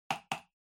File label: PC Mouse Far L Hard Double